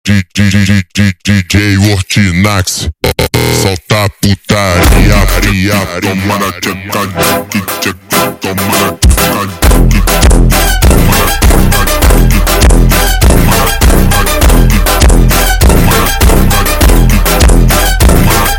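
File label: ultra Slowed, phonk music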